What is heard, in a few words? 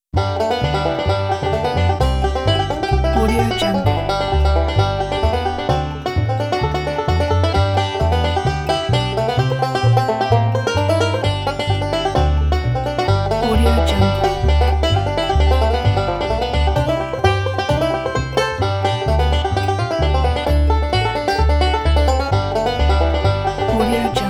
bluegrass banjo picking